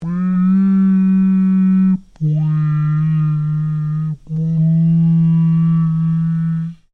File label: Created creature large sing; Created creatures, vocalisations and pretend speech; Aliens; SoundEffects Foundation2